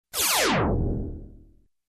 Futuristic Laser beam

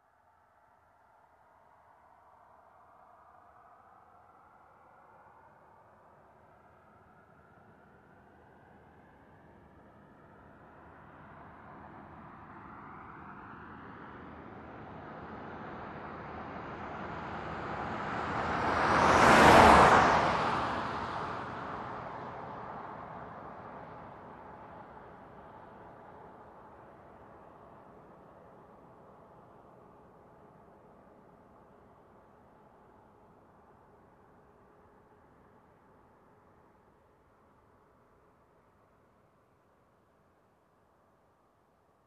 Car passing by

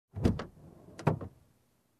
Opening Car Door

